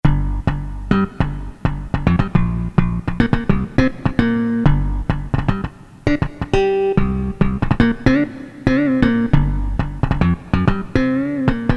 Chuk Bass, Korg DSS-1

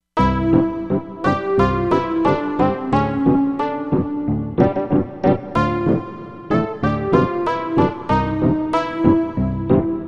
Wood Synth, Korg MSC-07S Synth; Korg MSC-07S Synth 2

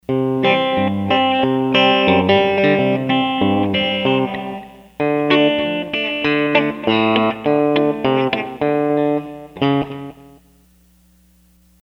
Chiken Pickin'; Sony HR-GP5

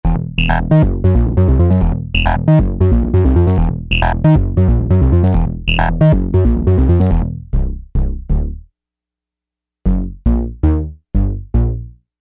alias Bass, synth

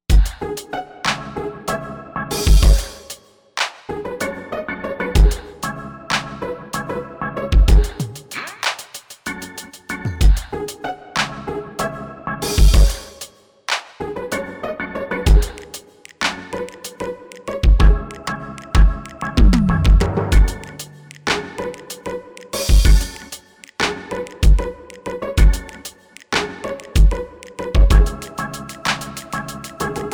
RAILRIDA, AKAI XR20 Factory patterns

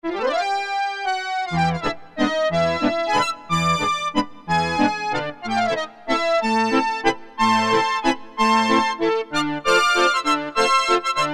Accordion

